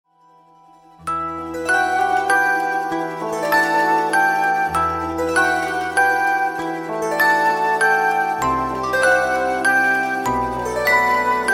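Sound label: fairy tale melody, fluffy clouds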